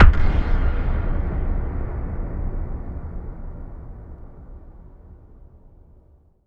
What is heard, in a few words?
FX Reverb kicks; vengeance essential club sounds-3